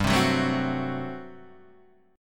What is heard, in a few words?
G Major 7th Suspended 2nd Suspended 4th G down Guitar Open D